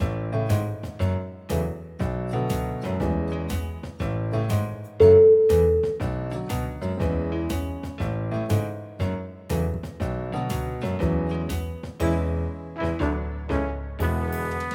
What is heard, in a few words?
catchy comedy jazz